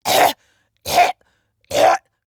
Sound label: Coughing; Pacifist; Warframe; Evacuation